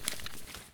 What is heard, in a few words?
medkit draw; item grizzly; Escape from Tarkov